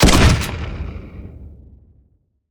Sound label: fire, weapons